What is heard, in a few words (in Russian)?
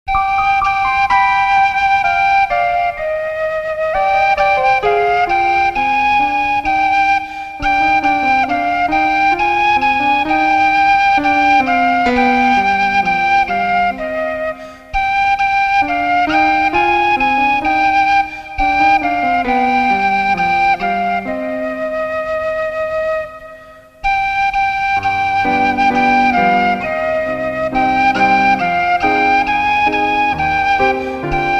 музыка